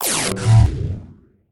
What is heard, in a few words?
whoosh; Whooshes and sweeps